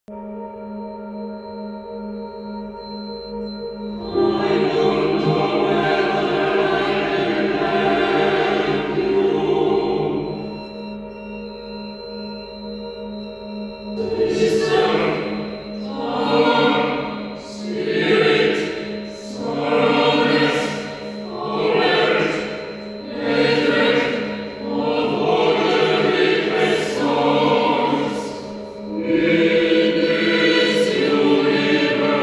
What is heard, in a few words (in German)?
trailer musik